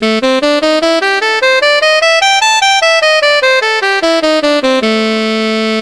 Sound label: eb blues, sax